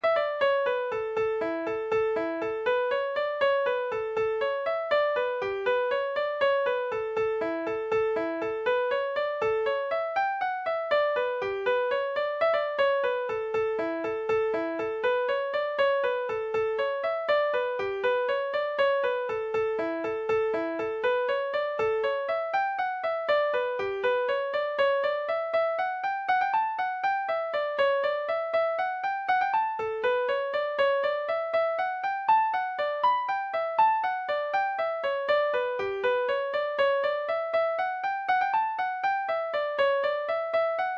jig; mooncoin; irish

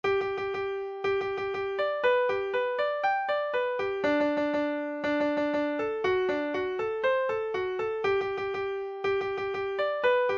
trumpet hornpipe

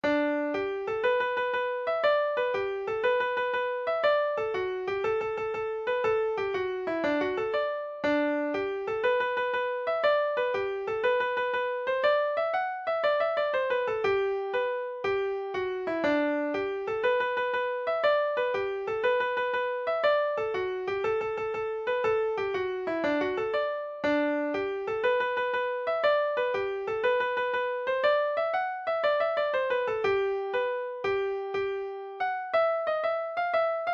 slow hornpipe, folk music midi

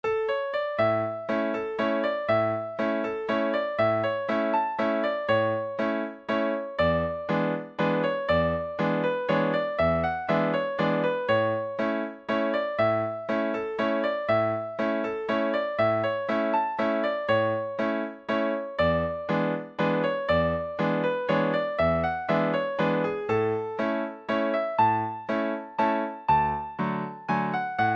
waltz

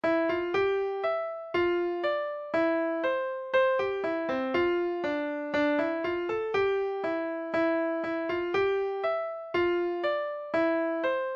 polka, folk music midi; pop along

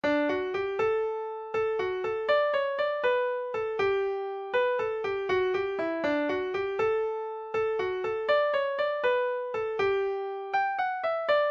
mazurka jig, folk music midi; sonny brogans

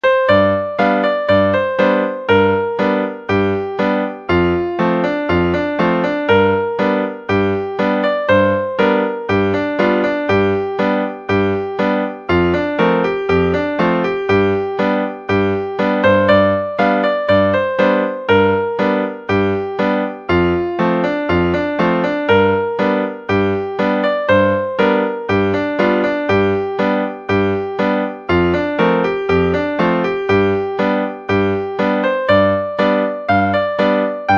rockbridge blues

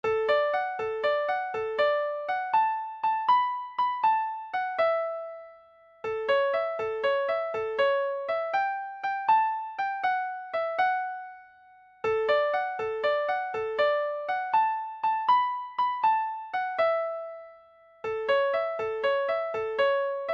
hunting horn d major; tams; scottish

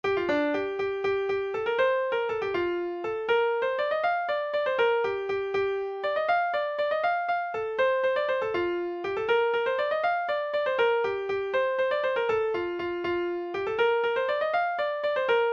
whistle

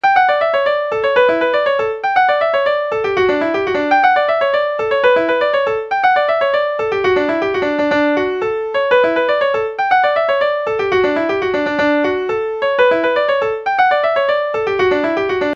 jig; black sheep

